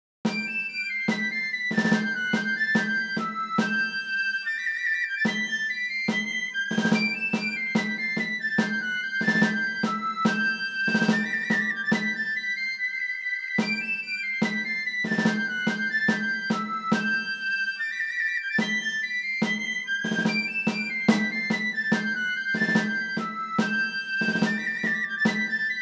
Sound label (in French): marche mousquetaires